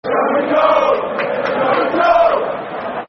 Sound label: Football Chants (Soccer Song); Chelsea Cfc